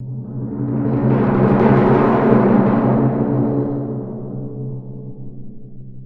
Drumroll

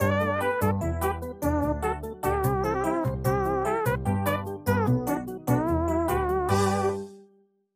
Elevator Jingle; Lethal Company

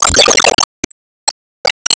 Explosion Burst; gamerip

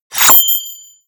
Coin Big Spawn Medium

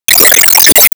Enemy Bigflame Active Shot Motion

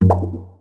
Bumper; Hello Kitty Bubblegum Girlfriends

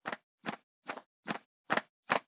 Fly in; Tsutsukera Fly in003

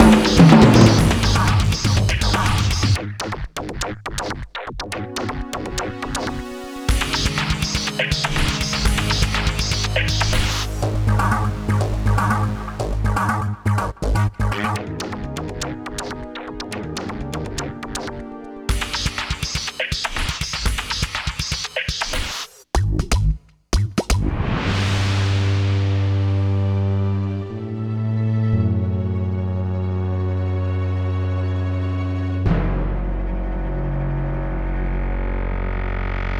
first fight music; Ben 10 Protector of Earth; Wii

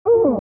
Vox Filtered Hurt; The Binding of Isaac; Mom

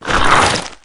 Hit; Wild West Shootout (Arcade) (gamerip) (2019)

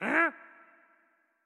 Hurt; Patella; Going Under

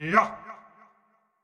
Wind Up Short; Marv

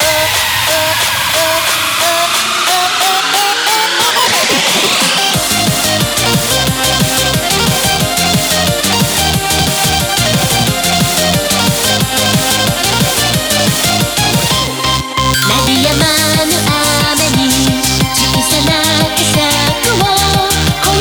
Bgm Reach

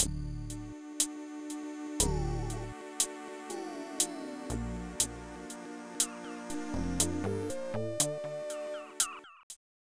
Continue; Sleepwalker; Amiga

